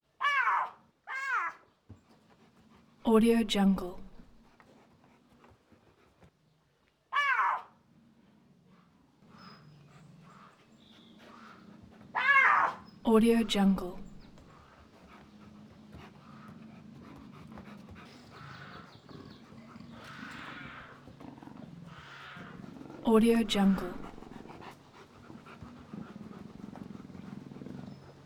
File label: cougar short vicious cries snarls breathing